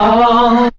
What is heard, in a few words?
ohhhh, voice; Notes